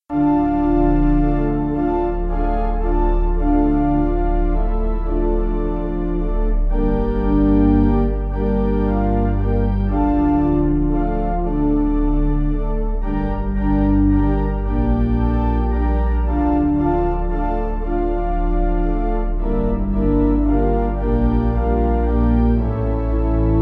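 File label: Pipe Soft, Pipe Organ, Music Backing Files for Churches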